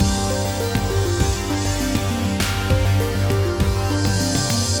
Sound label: Music Tuto Low Speed; Cyber Hook